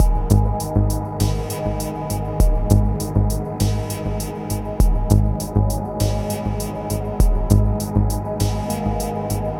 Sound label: Mellow loop